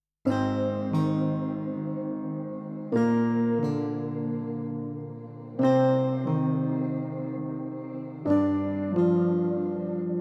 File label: acoustic